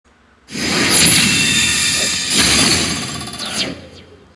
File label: destroy monster